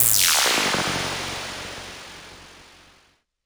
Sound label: Sci fi